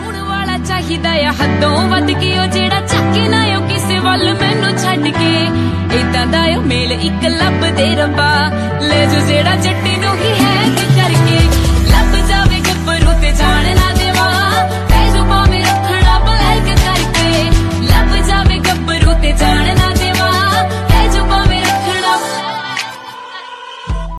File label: romantic punjabi ringtone